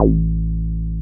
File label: 303 RES A2P, RES BASS